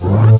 Deep Woop; PC; MARIOSFUNDAMENTALS

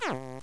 Map down; GAMECUBE; LUIGISMANSION

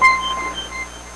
low health; GAMECUBE; SUPERMARIOSUNSHINE

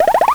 spin jump; DS; MARIO LUIGI PARTNERS IN TIME

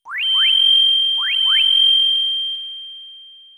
enemy aurora beam; SNES; SMRPG

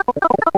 pipe; N64; YOSHISISLAND

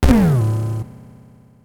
hammer pound; smithy; SNES; SMRPG

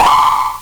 hammer whack; DS; MARIO LUIGI PARTNERS IN TIME

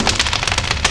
Fire Sfx; GAMECUBE; LUIGISMANSION

